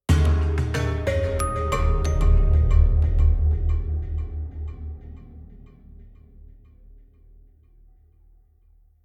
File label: Lazy machine negative; mokoko x